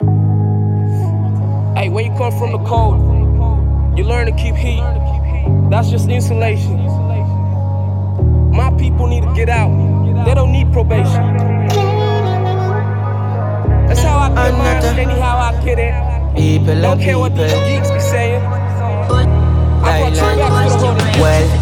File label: Clean